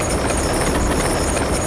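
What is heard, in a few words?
droideka Roll loop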